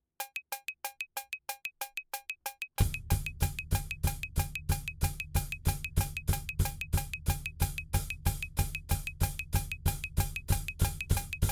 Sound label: Drums ONLY; Pre Pro